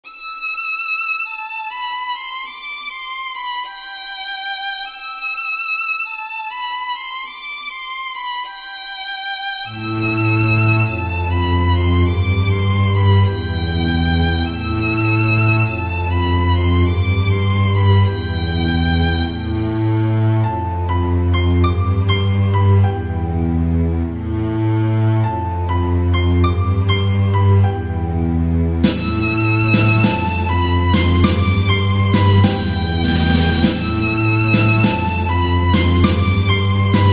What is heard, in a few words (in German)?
violine, Beats